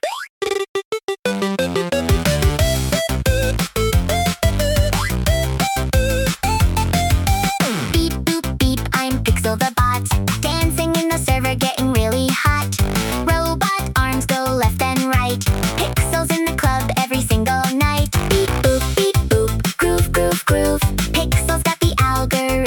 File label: pixel beep boop